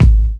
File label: BDRUM; E-Lab; X Static Goldmine 2; akai